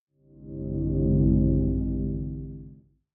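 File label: bell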